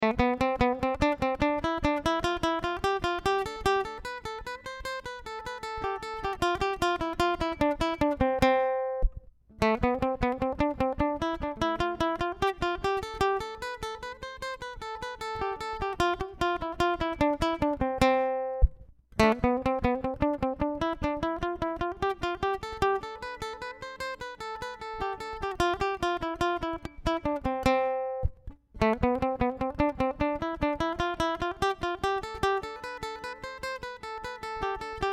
C Melodia Ejercicio Solo

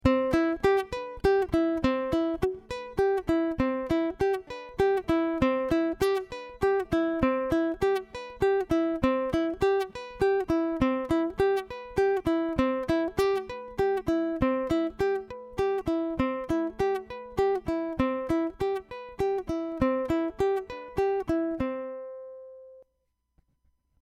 C Escala Back

